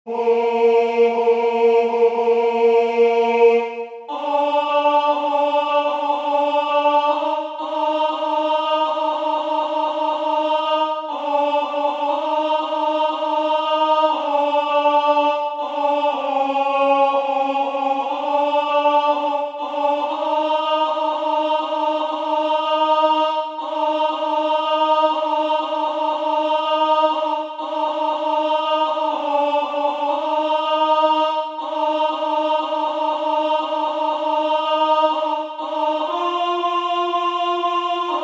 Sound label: Priere du soir Alto; Gounod